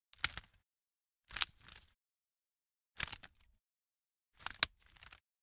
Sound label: Generic Click Wood Tiny; boom library; The Interface